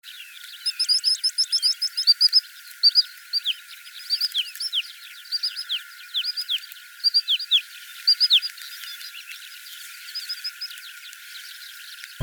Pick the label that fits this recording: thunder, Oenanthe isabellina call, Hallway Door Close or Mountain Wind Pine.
Oenanthe isabellina call